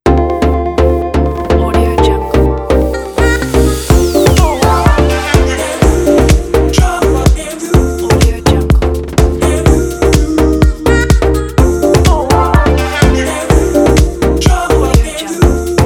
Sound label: house dance logo